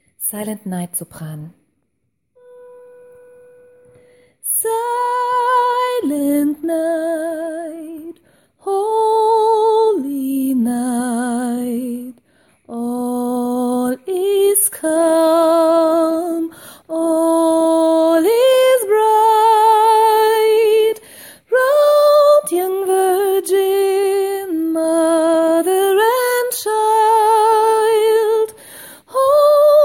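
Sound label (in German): Silent Night Sopran, gospel choir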